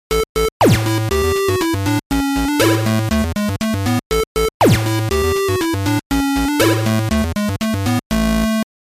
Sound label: Jingle